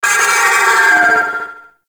Vocal Death; Warframe; Sentients; Sentient Trooper

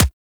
Weapon Punch Pistol; Warframe; Weapons

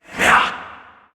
Vocal Attack; Warframe; PriestQuest; PossessedRedVeil

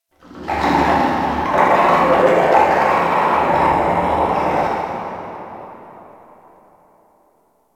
Corpus Infested Distant Creature Snarl; Warframe; Ambience

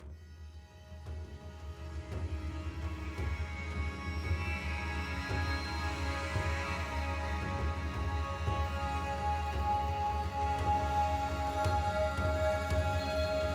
UI Menu Music; Silent Rose

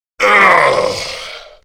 Death Grineer Male; Warframe; Battle Barks

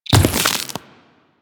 Ice Proj Form; Warframe; Corpus; Arachnoid Camper Terra; Abilities

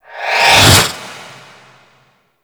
Sword Board Charge Throw Return; Warframe; Tenno

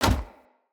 Heavy Grunt Boot Walk Gravel, Footsteps; Enemy; Sinecure

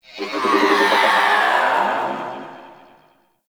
Ship Exterior Creature Calls; Warframe; Corpus Ship Remaster

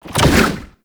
Mindhack Whip; Warframe; Infested Lich; Abilities; Zeke

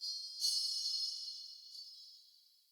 Alley Distant Chains; UnderCity

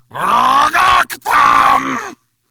Attack, Battle Barks

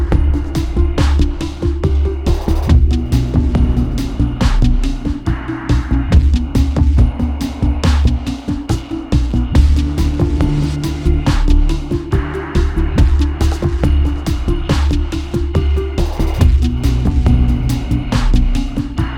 Weave Music; Night Wave Radio; The Glassmaker Music

